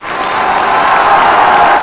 encore applause

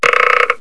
RATCHET

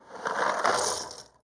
puzzfall; Virtual Jigsaw - MasterPieces

